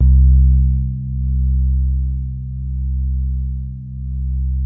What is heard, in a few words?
COND SOFT C1, E-PIANO; Music Store - Claudius Diemeter Schwerlos